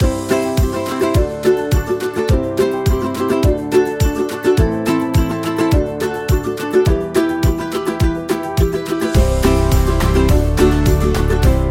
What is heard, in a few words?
Kids Loop